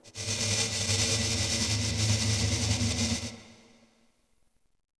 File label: Goodyhut Electric; Ambient; Sid Meier's Civilization 4 Complete; Beyond the Sword